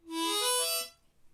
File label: harmonica major; abstract; Free Sound Assets